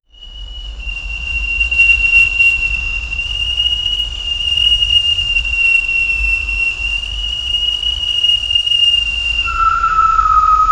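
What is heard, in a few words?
whistling through the trees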